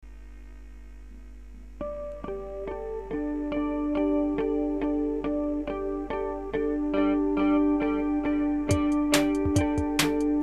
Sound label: Raindrops